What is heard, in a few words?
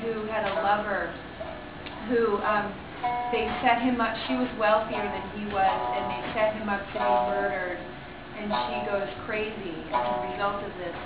shamisen player; Japan; Women; Asia; People